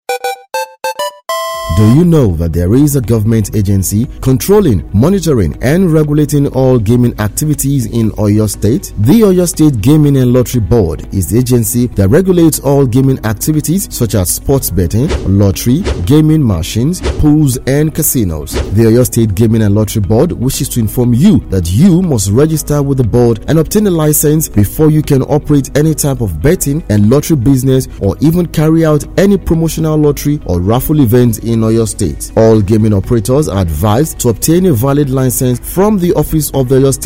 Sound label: warning jingle; OYSG; Eng